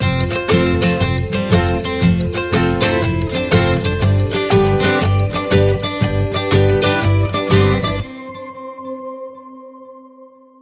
Happy Christmas Ukulele No Drums Sting

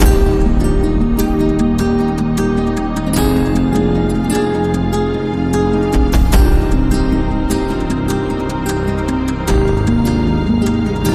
Cinematic Evolution, Loop

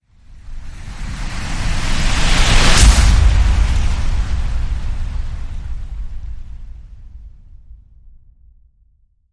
Wave Surge Boom

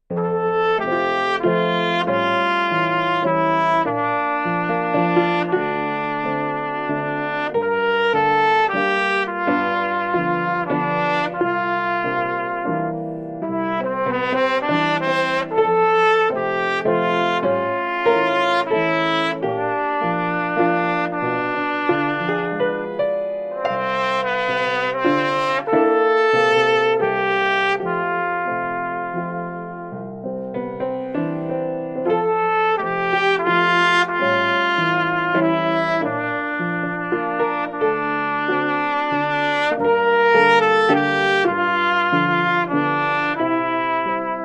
With Trumpet

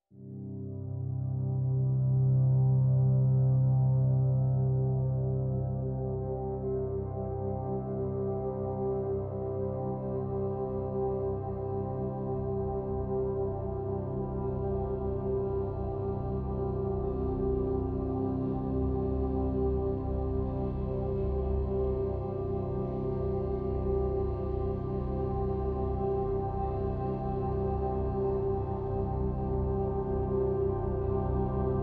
Pads (C)

